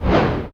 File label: cinematic impact wipe